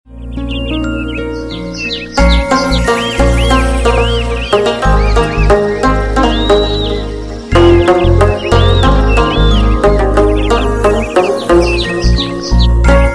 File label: best morning tone ringtone